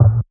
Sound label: Tom